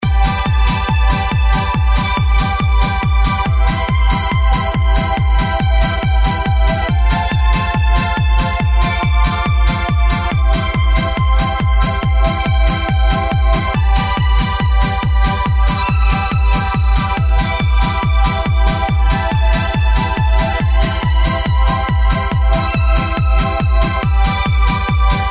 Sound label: electro symphony